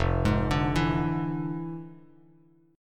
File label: F Minor Major 13th, Bass Standard; arpeggiated; JGuitar